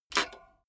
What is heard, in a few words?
bmx bike pedal foot release heavy